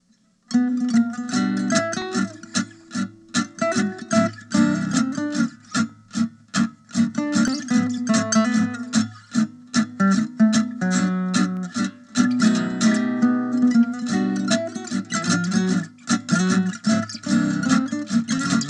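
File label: amp manouche mic, guitar; nux optima air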